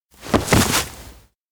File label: catch; anemoi